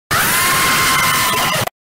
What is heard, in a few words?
scary sounds; ballerina girl; scary sounds home